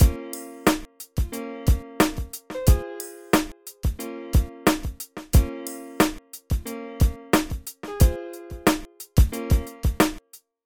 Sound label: I ll do it tomorrow 90bpm